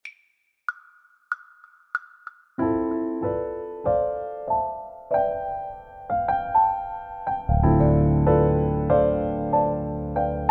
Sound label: Ambient Piano